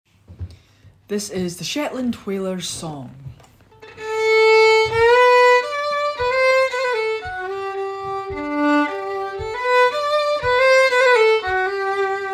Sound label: Shetland Whalers song, fiddle practice